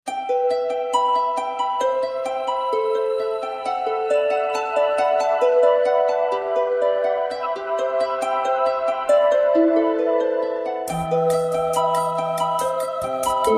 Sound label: Harp 'n' bass; melodic